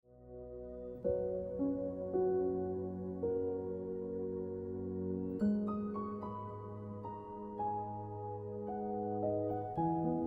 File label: Piano Music